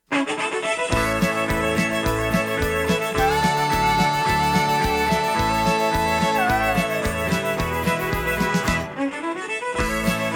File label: Cajun Fiddle Music